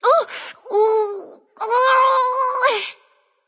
ouch; discordia

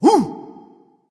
surprise; fafnir

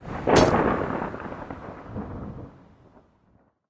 lobby landing; smite; chaac

